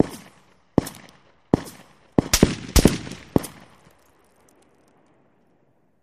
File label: Artillery War Seque